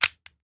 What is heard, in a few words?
lock, glock; weapons; Urban-Coalition; Decay; arccw ud